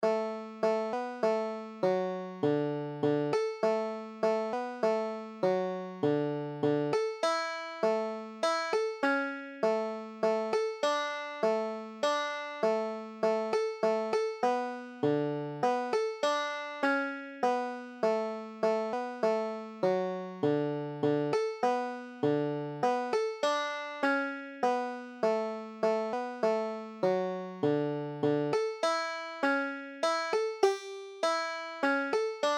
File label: silent night, banjo; Christmas; Double C D